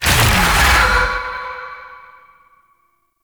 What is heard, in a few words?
Crystal Growths Cast; Warframe; Citrine